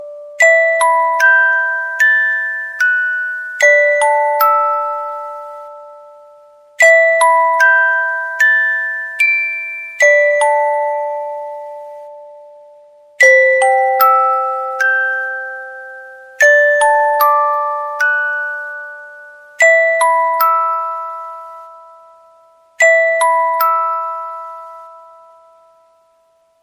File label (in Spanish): Caja Musical